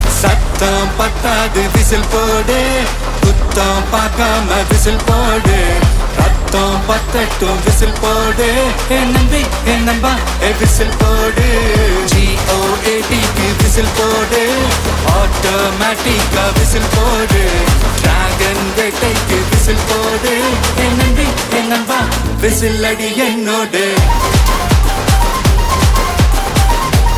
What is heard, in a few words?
Ringtone